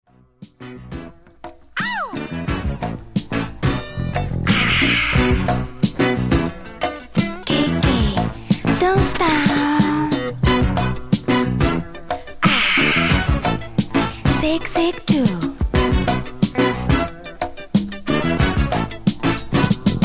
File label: Brazilian disco boogie